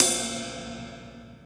ride single shot d key, Royality free ride cymbal sound clip tuned to the D note.